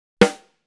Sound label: snare